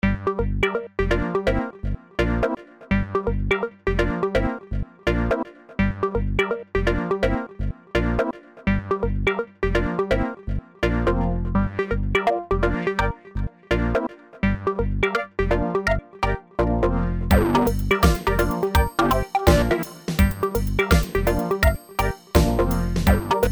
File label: Funky Walk